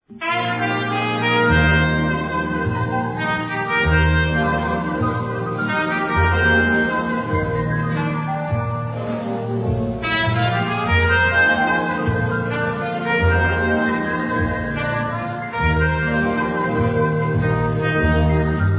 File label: Canoe Music Distant Carnival; Houseboat